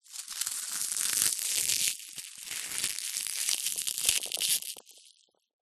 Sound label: silica gel packet small squeeze; zapsplat; foley